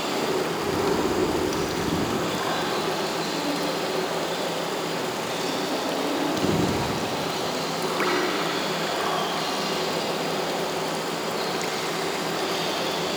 ambience greenhouse

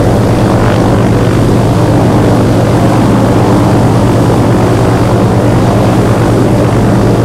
c46 rear, engines; War Thunder

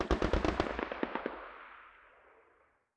combat atmosphere; World of Tanks

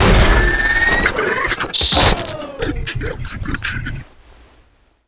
terracotta front land

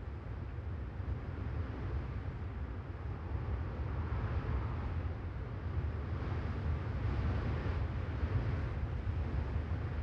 ambient battle; World Of Warships

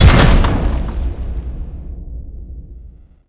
biomech land soft

